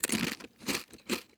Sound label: eat; Rust